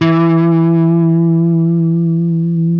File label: Les Paul, guitar; Spectrasonics; Hans Zimmer Guitars VOL-1